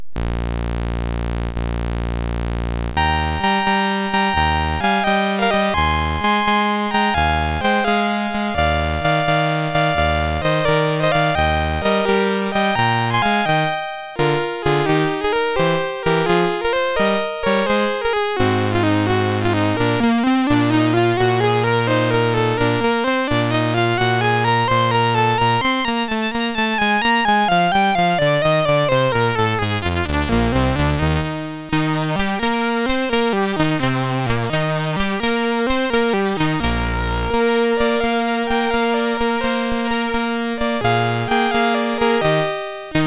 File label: mus MOS6581R3; Pirates of Penzance; Opening Chorus of Pirates and Solo